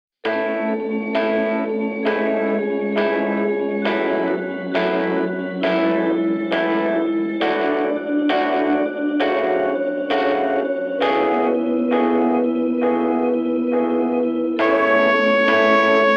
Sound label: instrumental